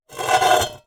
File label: Drag, Vase